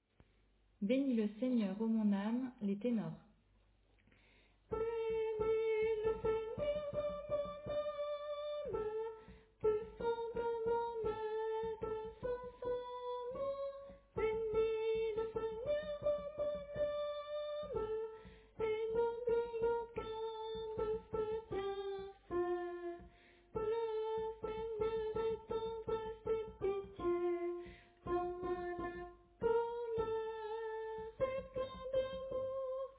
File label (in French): Tenor